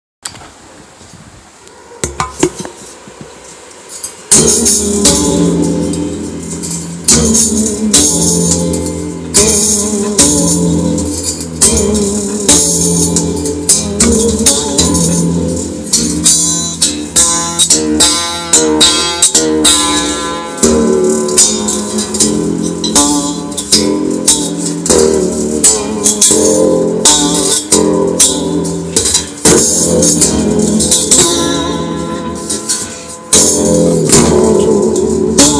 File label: Windcycle Strings; lipsonlab